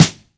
short reverb snare one shot e key, Royality free snare single hit tuned to the E note